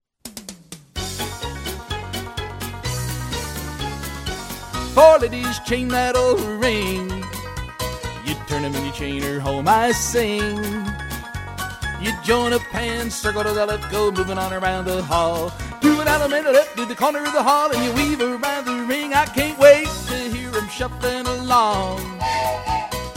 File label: vocal